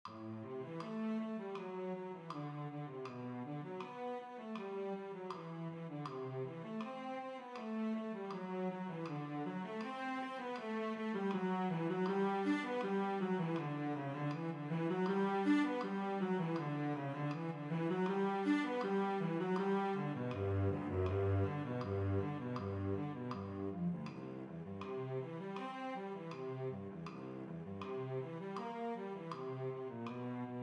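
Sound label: Cello, Chamber String Orchestra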